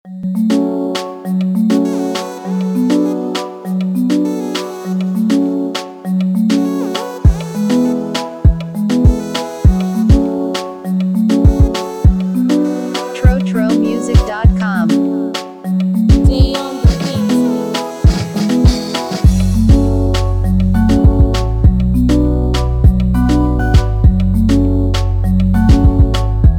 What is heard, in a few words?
Instrumental Afro Pop Rhythm